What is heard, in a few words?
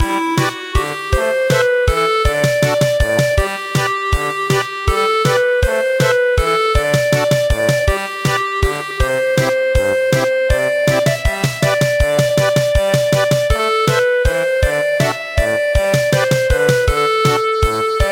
German Polka; Muran of England